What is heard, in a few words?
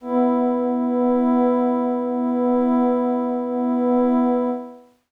Glass Howl Kawaii E3; pads